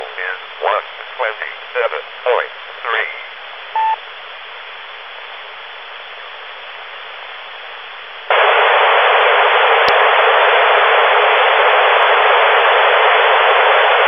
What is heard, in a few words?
Unknown 145.112 MHz; sdr